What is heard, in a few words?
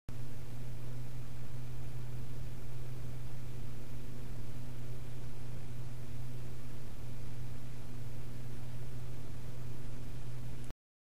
Lepa 70D 7V